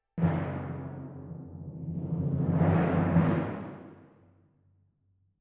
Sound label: Timp